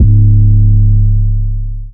808 tech C#, Tonal; Hip-Hop; Slate; CAICOS Hip-Hop Sample Pack

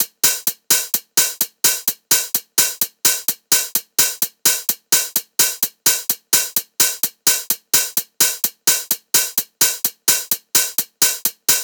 hats 128, Hat Loops; drum sample pack; SEISMIC; Slate

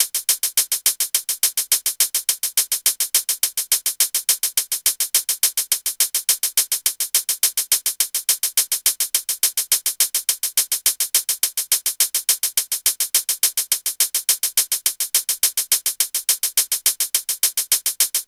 hat 105, Hat Loops; Slate; SD MIDNIGHT Sample Pack; National

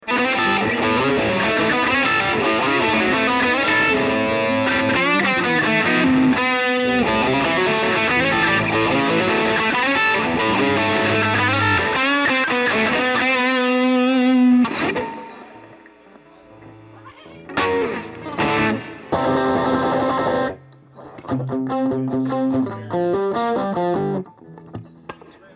Guitars Mix

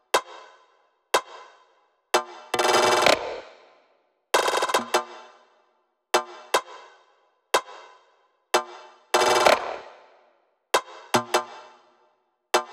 perc 150; Drum Sample Pack; Loops; SD SEISMIC; Slate

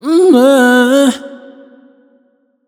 riff fluttery C#m wet, Vocal; Slate Digital Omnivox Vocal Sample Pack

